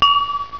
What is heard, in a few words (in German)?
klirr